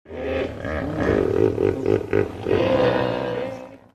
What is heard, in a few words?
hippo